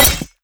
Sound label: scythe break